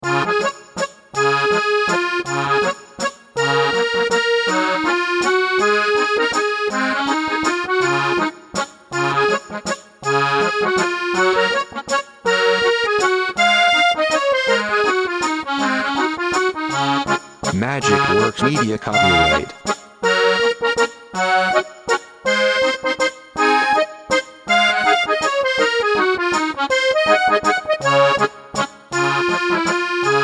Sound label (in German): Volksmusik